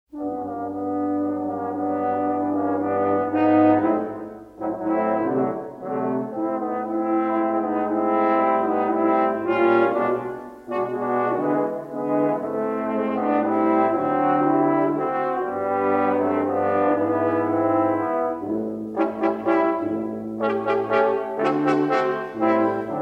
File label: trombone quartet